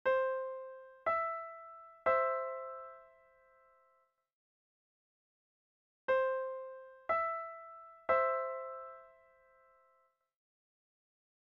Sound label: treble c e